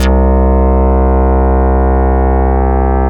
Bass Note C; Best Service; House Definition VOL-1; Basses

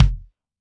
Dry Kick, Drum Machines Roland R-5

